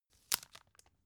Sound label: tissue open soft